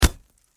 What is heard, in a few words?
Impact Bullet Windshield Hit Glass; Glass Sound Effects; Glass (Sound Effects:Materials); SFX Bible 6